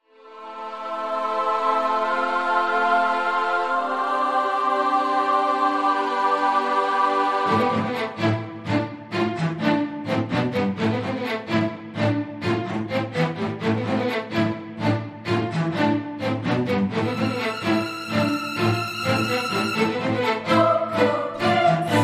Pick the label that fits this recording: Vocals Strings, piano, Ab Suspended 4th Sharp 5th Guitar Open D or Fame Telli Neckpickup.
Vocals Strings